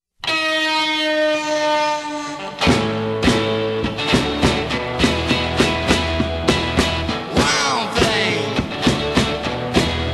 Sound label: song